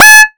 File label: Star Instruments Synare PS-1